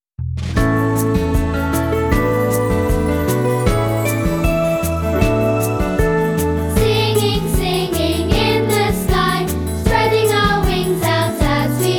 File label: Vocal